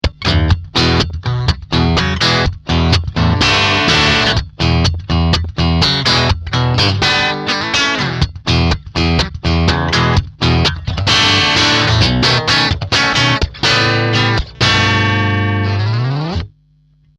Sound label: Mellow Crunch